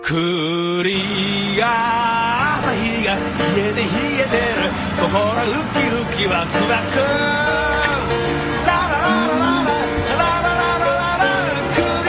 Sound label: beer song; asahi clear